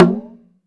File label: ETHNO, PERCUSSION